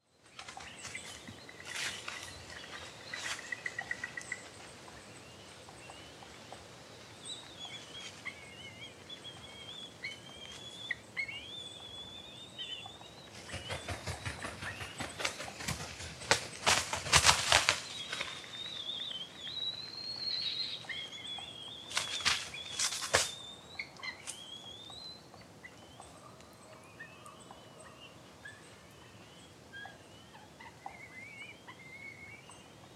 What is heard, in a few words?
European Honey Buzzard; سارگپه جنگلی